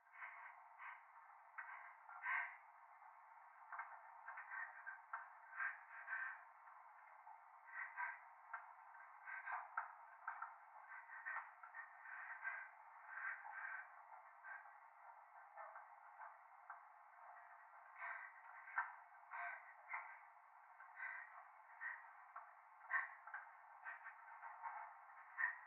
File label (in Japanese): トイレ 左耳 快感 吐息 電車